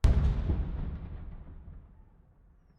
Bomb

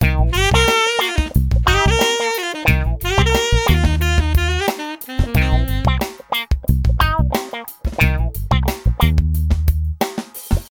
Alto Sax 90bpm Cm Band

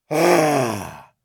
Zombie Hit

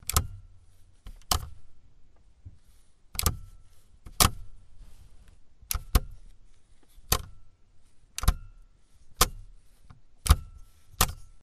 Blinkers

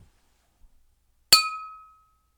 Glass Clink

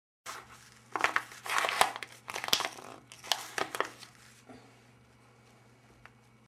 Paper Crumple

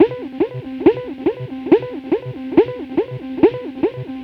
140bpm 303

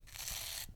Brush Slow

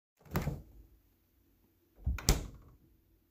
Fridge Open And Close

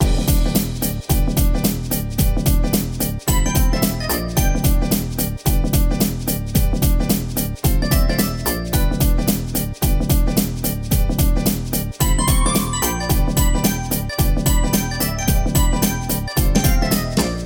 Bass Line Theme Loop

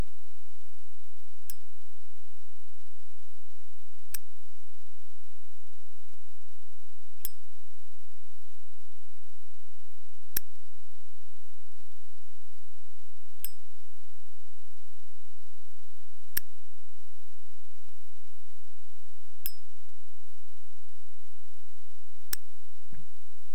Lighter

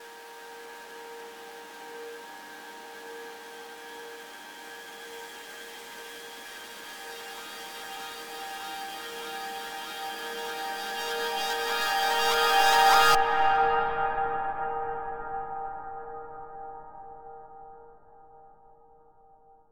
Reverse Melodic Riser W Reverb Tail

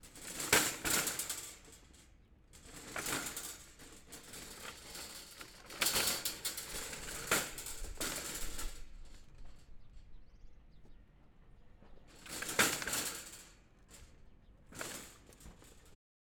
Metal Trolley With Broken Wheel

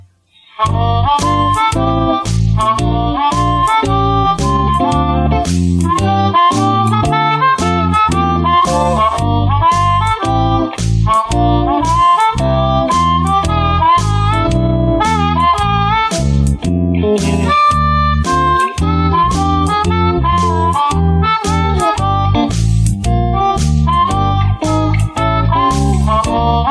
Blues

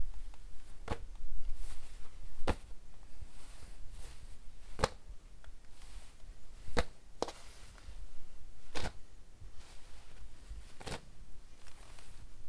Clothes Dropping

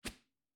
Swing

